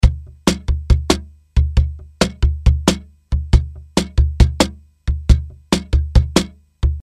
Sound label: cajon